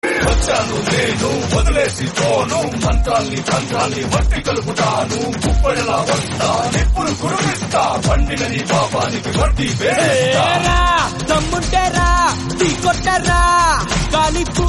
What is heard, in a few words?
telugu song